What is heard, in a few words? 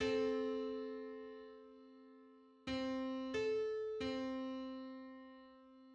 Four-hundred-twenty-fifth harmonic on C